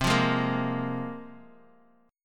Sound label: C 7th Suspended 4th Sharp 5th, Bass Standard; C7sus4#5 strummed